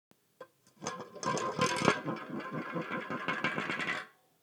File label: Falling ashtray, metallic